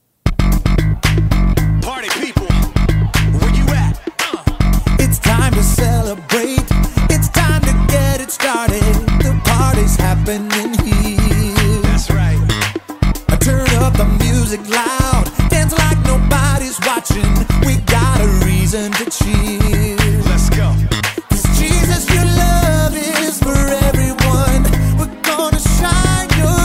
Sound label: Vocals Parlando